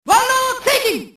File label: world shaking